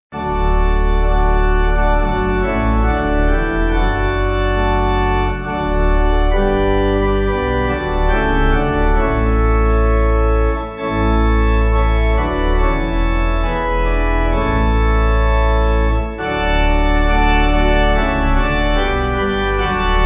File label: May We Thy Precepts, Pipe; Organ; Meribah